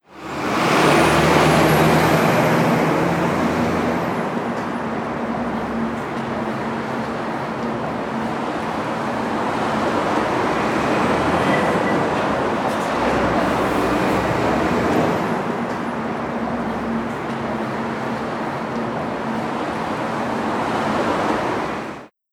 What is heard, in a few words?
Cities nyc Traffic heavy